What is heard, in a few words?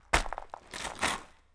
Rocky Step, outdoor, Footsteps